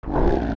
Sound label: Dead Hand Hold; zelda; OOT